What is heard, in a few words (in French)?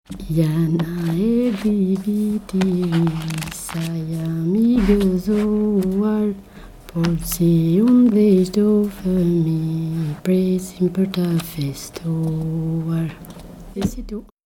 Chant; ABBAYE